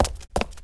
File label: Run; Seer; GamigoNA